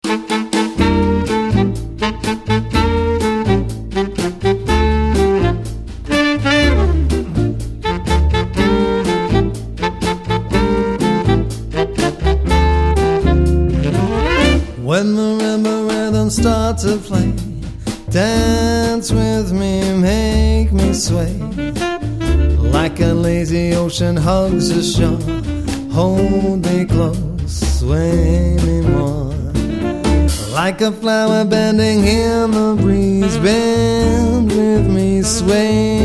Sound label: quartet